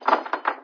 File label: clank